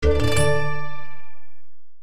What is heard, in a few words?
Chinese slide open; Nokia; Audio themes